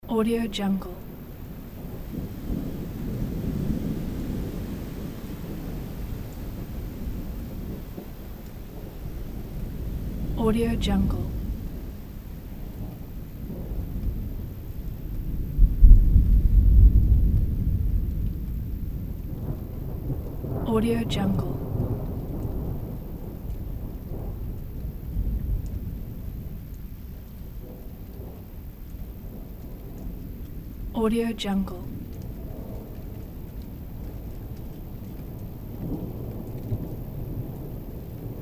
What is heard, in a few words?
thunderstorm in the distance and light rain; teramangala